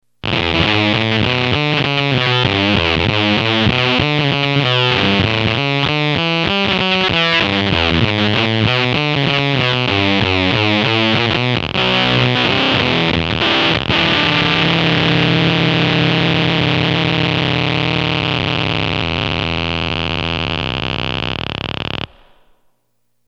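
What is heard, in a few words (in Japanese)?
fuzz, fuzz 「ジリジリ」としたファズの代表的なサウンド。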